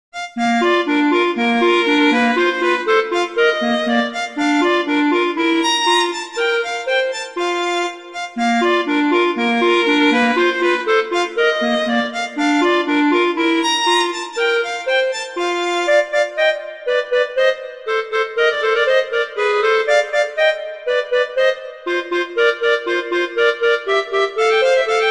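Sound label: Duet, horn; Mozart; Duets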